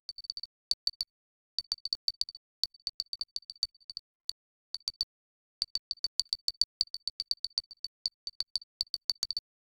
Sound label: soft ui text typing sound